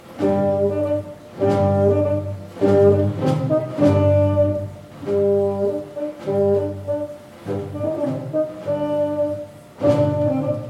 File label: Carmen concert; Fougeres